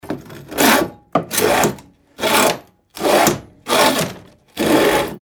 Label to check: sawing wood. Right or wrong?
Right